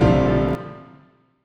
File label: piano stab